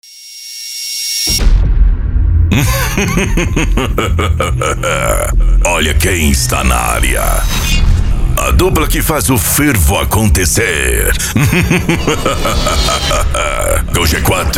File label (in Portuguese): funk mega bass e flash back